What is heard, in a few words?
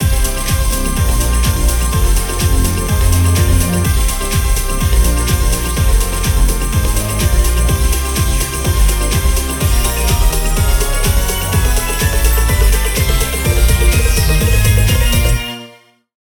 big win loop, casino; spinsane